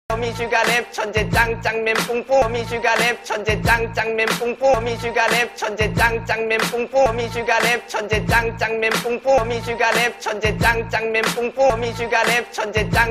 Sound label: Ringtone